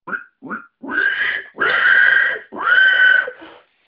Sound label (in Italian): verso cinghiale; fauna